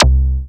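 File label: bass attack, EMU Proteus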